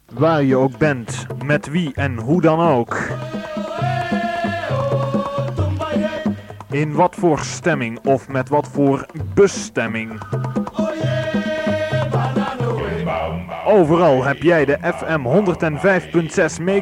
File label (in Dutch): Jingle Overal; Grafiek